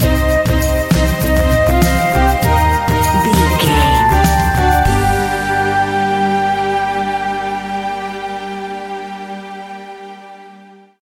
arabian sea stinger, An exotic and colorful piece of World music. Groovy, powerful, exotic and wild; Non western m